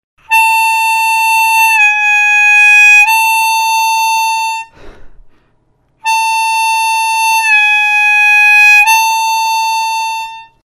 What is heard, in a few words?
draw bend hole 6, armonica